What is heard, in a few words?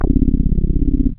Bass